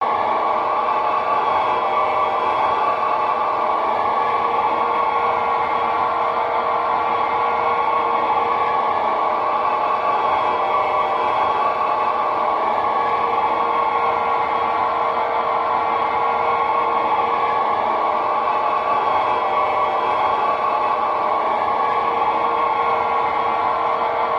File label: Ambience Machine Wh; drones & tones